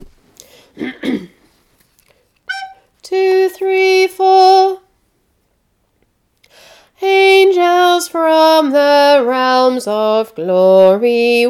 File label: angels tenor